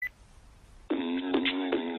beatbox

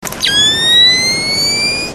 night vision goggle sound effect; splinter cell

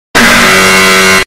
extremely loud incorrect buzzer slowed

